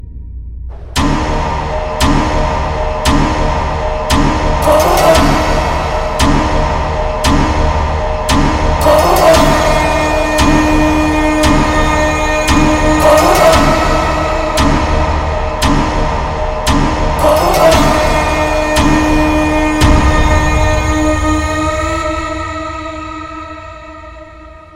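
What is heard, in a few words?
background music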